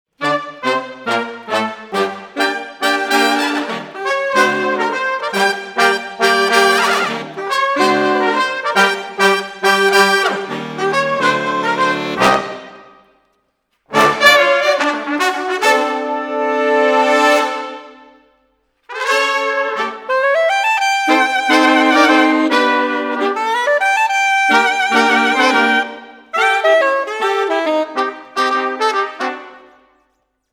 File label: Brass, Jazz for piano